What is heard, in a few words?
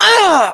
pain